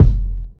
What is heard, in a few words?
Kick